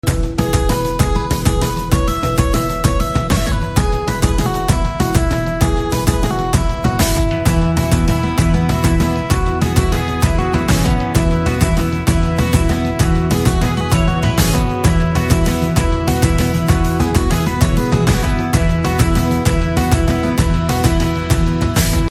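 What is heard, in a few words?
background music Telugu Ringtone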